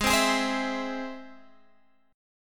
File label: G sharp Augmented, Guitar, Drop D; 18,15,18,17,17,x